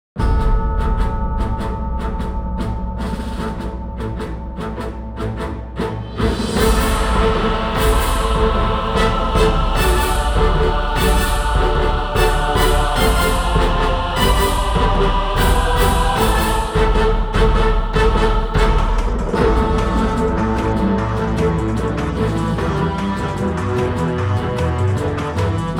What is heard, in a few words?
music main theme; DUNGEON DEFENSE